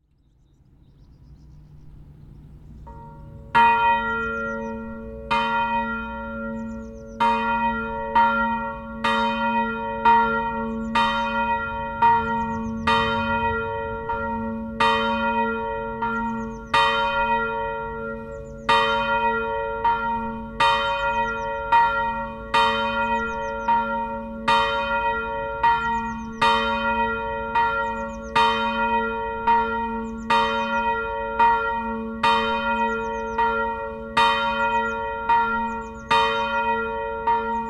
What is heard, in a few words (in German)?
westglocke; friedhofsverwaltung